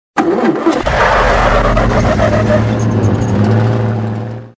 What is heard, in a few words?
truck start; Soldier Of Fortune II Double Helix; XBOX; ambience